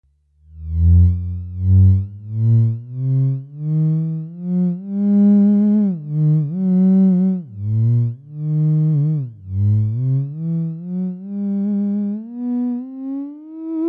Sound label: smooth, theremin